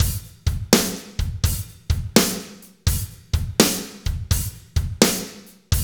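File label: slow shuffle, Roland drum beats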